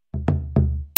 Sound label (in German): dumpfe Trommeln